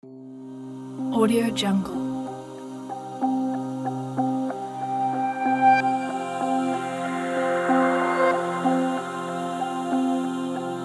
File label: relaxing ambient peaceful meditation